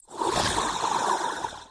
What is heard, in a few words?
alien lobby landing; serqet; smite